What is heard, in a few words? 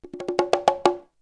Conga; ZERO G - Datafile DISC 3